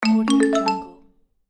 upbeat mallets notification; monochromeeffect

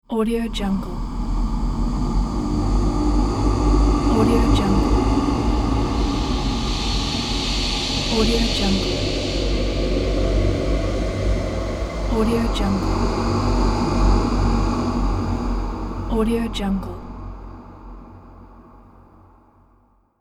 egyptian horror of the sands

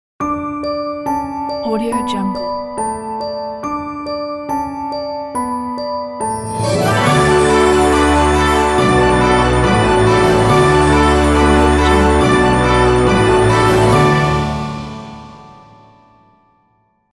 new year bells logo

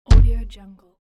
thud; applehillstudios

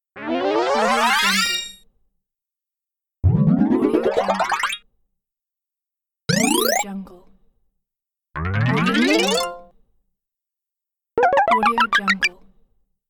powerup lively ideas

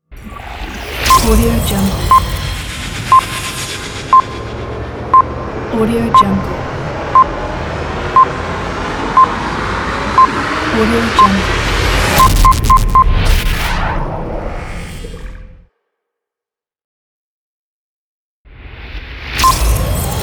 countdown

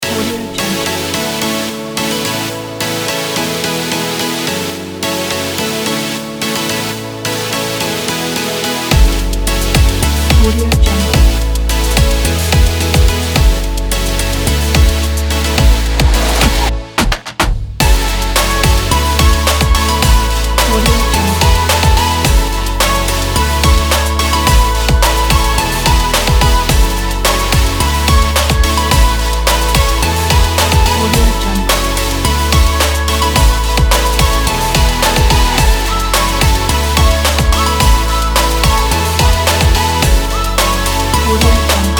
upbeat fun summer pop; honores

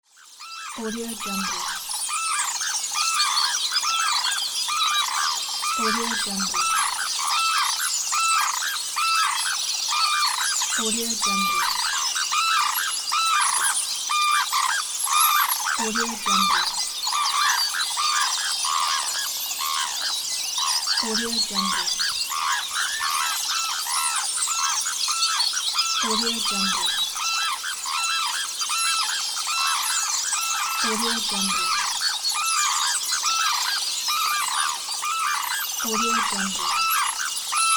birds

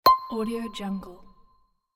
notification

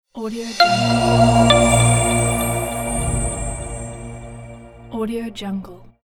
logo

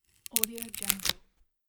wood cut thin; electronplanet

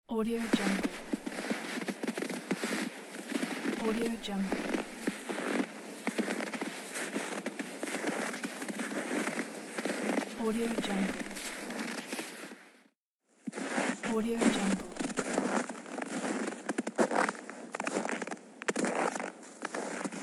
walking on snow; djsapa

